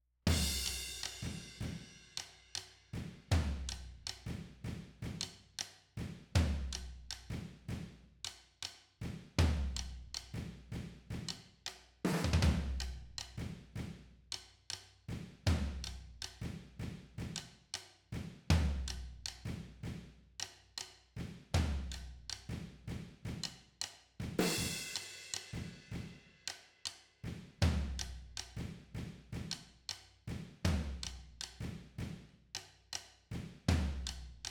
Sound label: toms, ovrhds, room